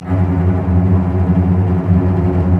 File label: STR SEC; Roland - LA Composer vol. 1